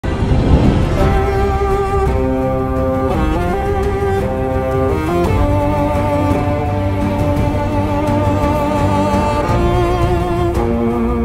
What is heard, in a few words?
qobyz instrumental; Game of Thrones